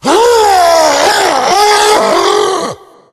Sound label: hulk yell, tank voice yell